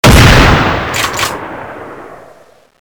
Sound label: mosin